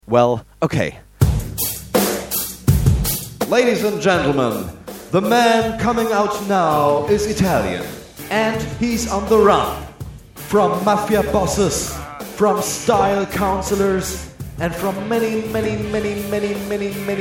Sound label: intro jingle; englische Ansage für Gastspiel in Las Vegas